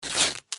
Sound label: tear